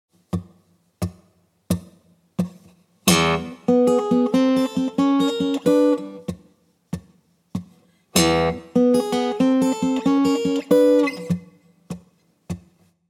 Blues Guitar Turnaround

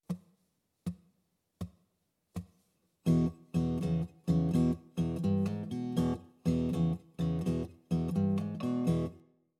Open Tunings For Blues Guitar

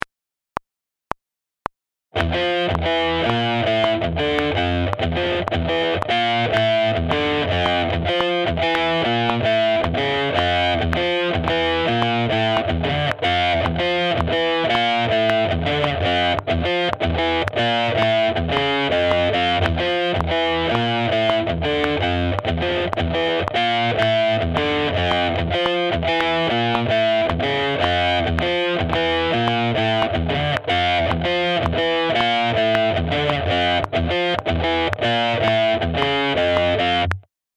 Metal Rhythm Guitar

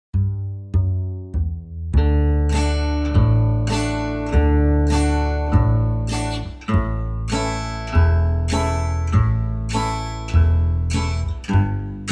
Country Guitar; Learn Country Guitar

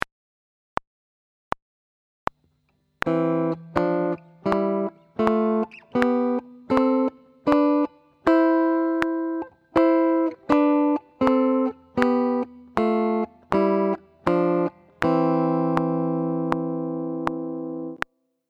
Pop Sixths

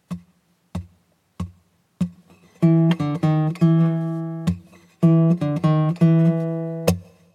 Blues Guitar Turnaround

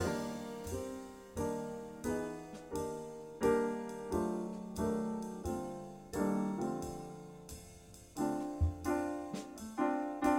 backing track

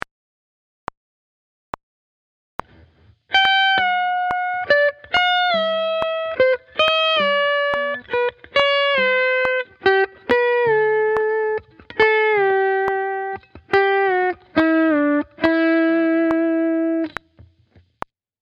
Paying Guitar with Expression

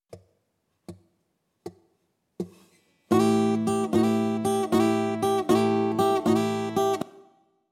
Open Tunings For Blues Rock Guitar

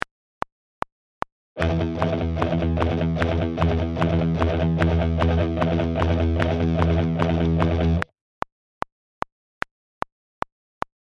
Metal Rhythm Guitar

